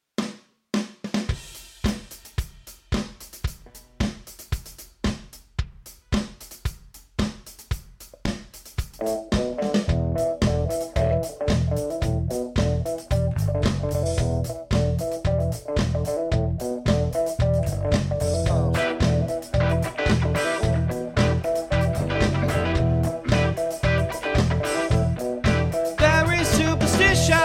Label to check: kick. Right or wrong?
Wrong